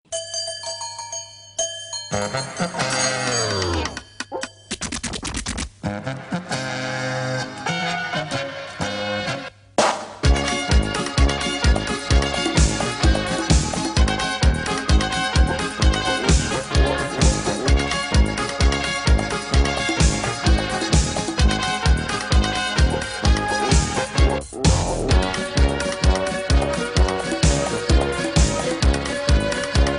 Techno

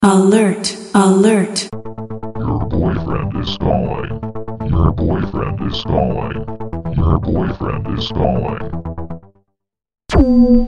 boyfriend calling

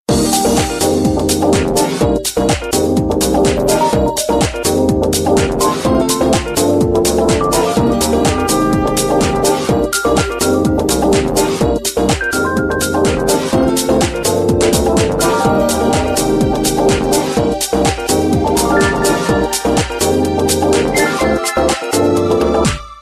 Nice Rock Music

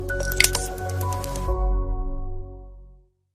notification

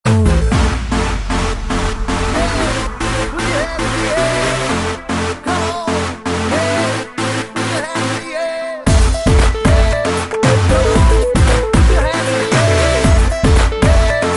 Rocking Tune

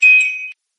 chime; AE86

